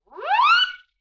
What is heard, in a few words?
Wind Whistle Zip